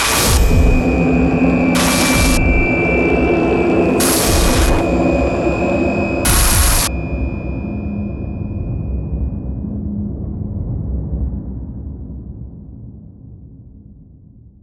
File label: Tension Builder Impact